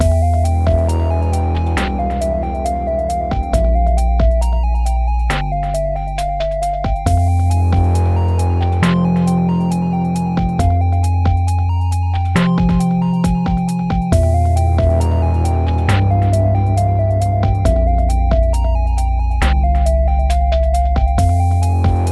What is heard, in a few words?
loop; Cave Story 3D